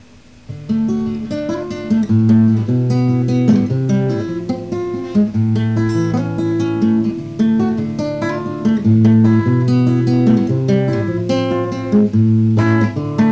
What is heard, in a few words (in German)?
Guitarre, compressed